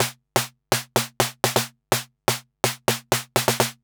perc analog 125, Percussion Loops, Classic Chicago House